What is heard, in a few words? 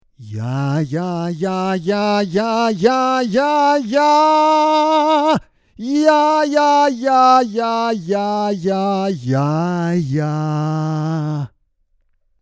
Saturation Normal Plate